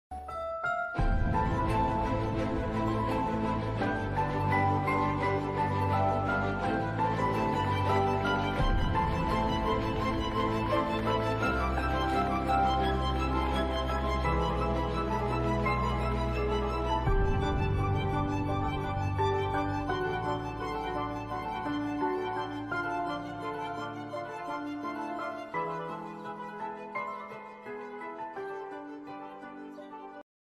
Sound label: Flute Bgm